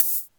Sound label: 909 Hat Open